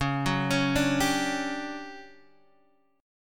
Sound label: D Major 11th Db, chord